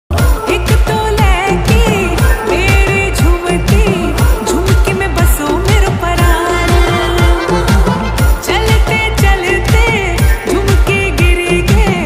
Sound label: uttrakhandi ringtone